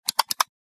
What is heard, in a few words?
Plastic computer mouse double click